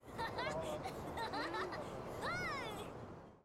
voice of an angel

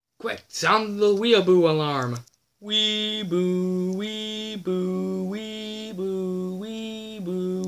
the weeaboo alarm

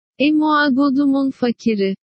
emmmmmmmmm

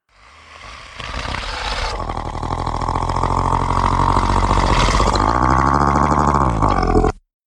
monster rising growl

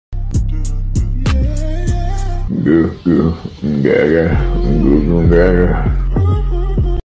goo goo gae gae

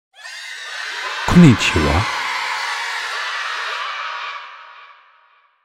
hello jap cheering hype

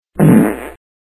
small fart

